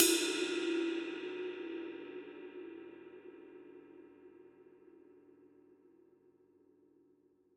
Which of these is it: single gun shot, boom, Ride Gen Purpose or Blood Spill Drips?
Ride Gen Purpose